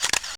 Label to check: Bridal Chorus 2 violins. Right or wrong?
Wrong